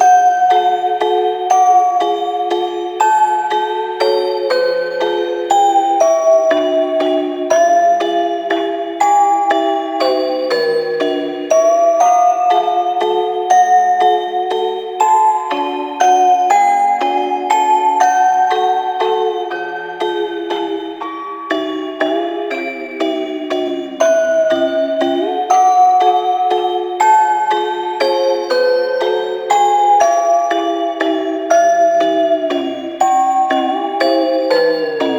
Music Box Loop; Shadowgrapher; Warframe

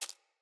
Rat Step, Foley

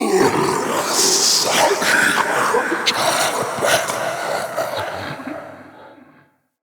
Male Vocal Stand Long, Enemy; Mockery; Mockeries

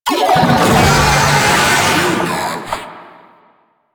Attack, Vocals; Proto Infested Babau

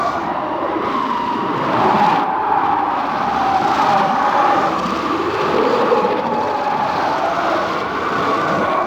Sentient Vessel Head Wind Loop; Warframe; Tau

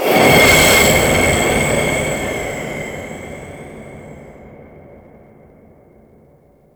Void Tower Capture Successful

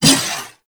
Shotgun First Shot; Tenno; Alchemist

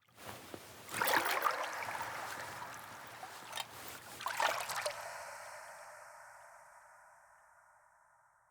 Water Rescue Start; Soulplane

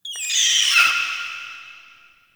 Interior Screechy Call; Ambience; Indoor; Warframe; Infested Eris

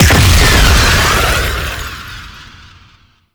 Octopede Wail End Burst; Warframe; ManInTheWall

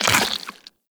Step Goo, Footsteps, Walk; Warframe; Tenno

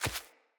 Pack Wolf Step Single; Animals; Footsteps

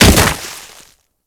Kubrow Land Snow, Footsteps; Enemies; Warframe

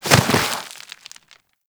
Generic Roll Dirt; Warframe; Characters

